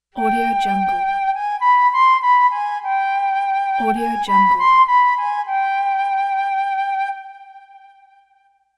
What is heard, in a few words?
magic flute; soundsground